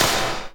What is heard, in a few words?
gimpy, snares real